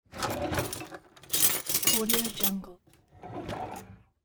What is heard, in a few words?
silverware drawer